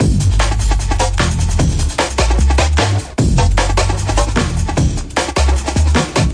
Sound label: 151 phat jungle music; 141 to 160 bpm